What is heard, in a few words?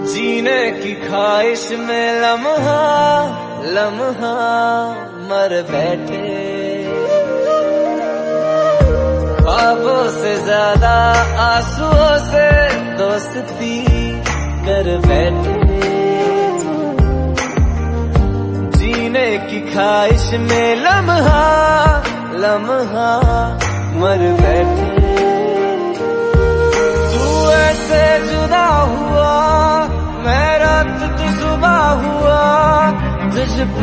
Hindi Song Ringtone